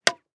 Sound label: tennis racquet hit; prosoundfx